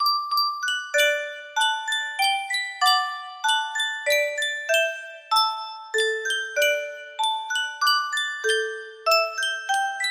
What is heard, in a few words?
sankyo spieluhr, music box melody